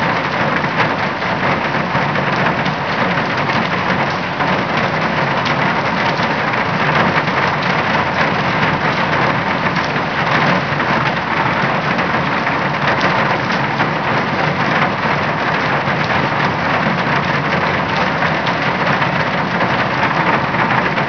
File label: rain roof